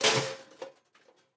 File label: metal break; teardown; ps5 windows xbox series xs